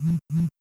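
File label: vibration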